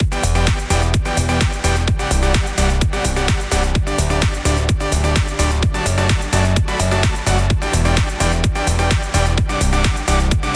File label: 4Beat Bass Synth; メインのシンセパートは3種類のシンセの音を重ねて、軽くオーバードライブを掛けてより太い音になるように調整しています。; 更にシンセも入れて楽曲っぽくすると